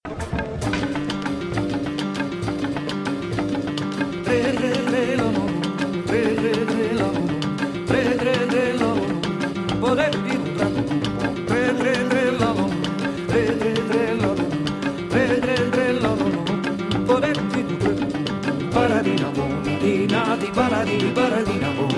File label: canto